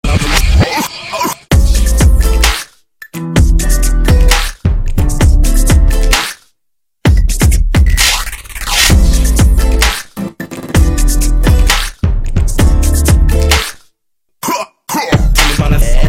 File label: crunk instrumental